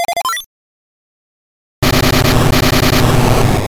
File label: Present Bomb; Pokemon; Gen 2; attack moves; GSC